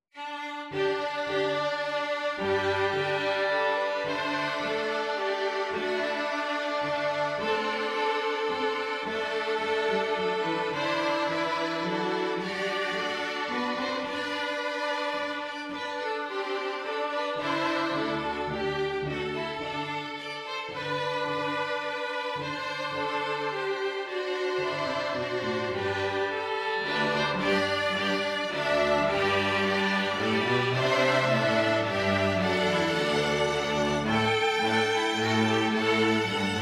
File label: cello; Spanish Ladies; young strings bowed parts